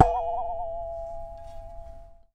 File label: Wobble, Japanese Pull Saw